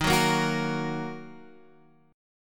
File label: D sharp Minor, Guitar, Standard; strummed; JGuitar